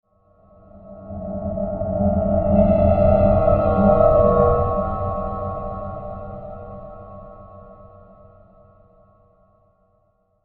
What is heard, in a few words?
SWELL RING MET, Swell Ring Metallic Ghostly; Production Element Imaging Element Accent Transition